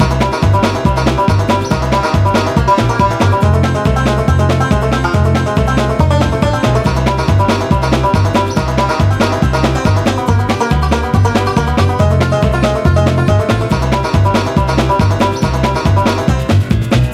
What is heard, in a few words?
Sack Race Music; Birthday Party Bash; Wii; Spanish